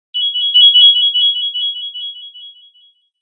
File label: Peal, Nokia Ringtones; 7310 Supernova